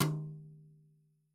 Toms, Yamaha RY20

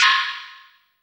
Synth Perc; Dream Zone

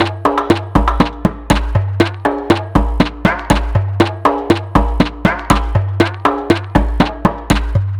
Conga Loop, Ethnic Instruments; Sonic Foundry (Sony Creative Software); The Electronic Music Manuscript Collection

